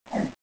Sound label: chomp